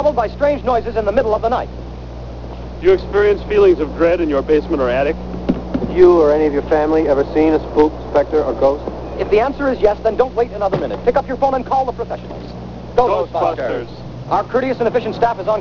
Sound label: GHOST